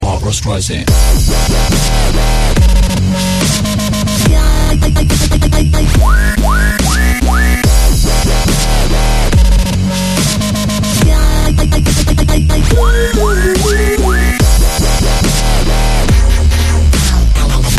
dubstep

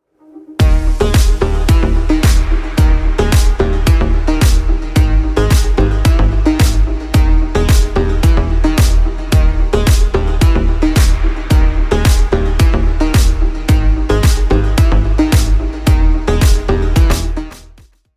club music

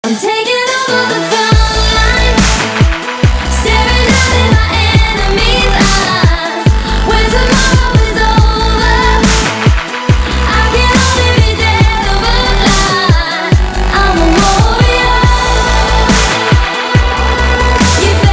dubstep

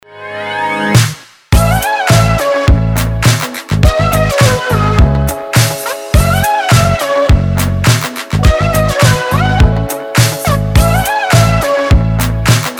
relax with slide

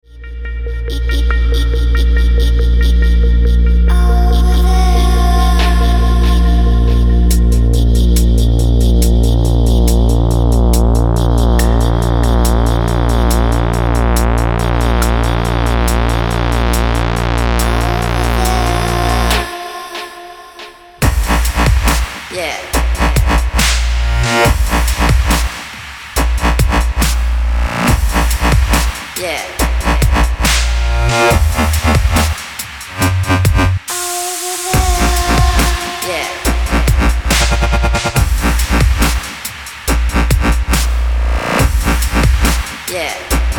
dubstep

